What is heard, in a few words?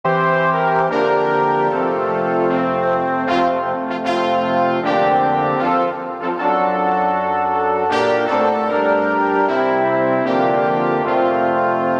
O Christmas Tree